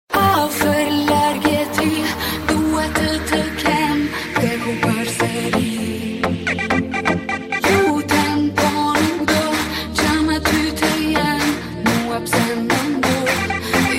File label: oppo smart phone ringtone